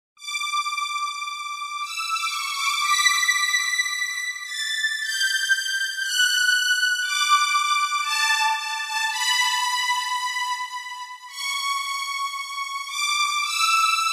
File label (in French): cordes